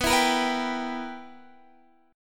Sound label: B Major 7th Suspended 4th Sharp 5th Guitar Standard, chord; BM7sus4#5; strummed; x,14,14,15,12,15